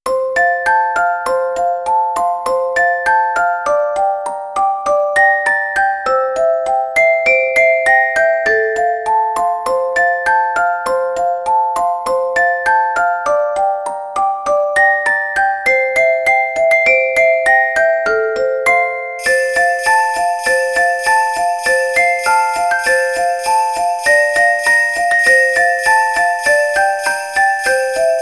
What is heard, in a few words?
jingle